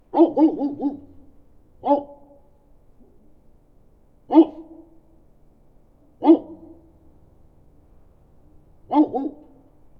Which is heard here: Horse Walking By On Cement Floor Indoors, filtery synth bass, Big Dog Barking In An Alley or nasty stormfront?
Big Dog Barking In An Alley